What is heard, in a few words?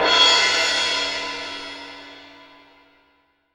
16, MALLET CYMBL